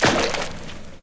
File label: paint blob splat